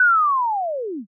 whoosh down

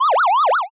spin

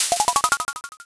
board flip

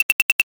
calculator

